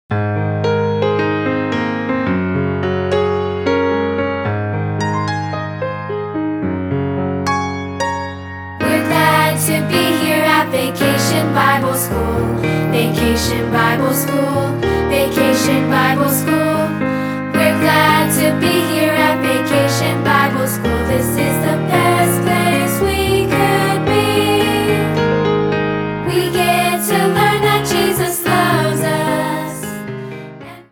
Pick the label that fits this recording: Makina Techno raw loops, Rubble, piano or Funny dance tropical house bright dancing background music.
piano